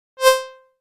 Violin C, synthlead

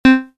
Triton, synth lead

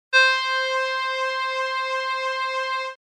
Voice

